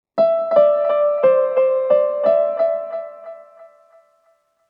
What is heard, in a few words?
One shot Piano; zombie army 4 dead war ps4 stadia switch windows xbox one gamerip 2020